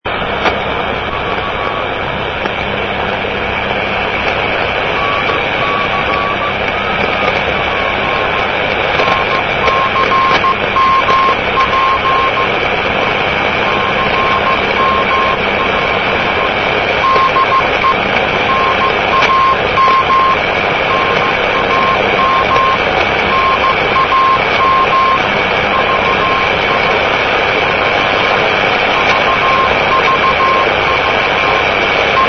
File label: cw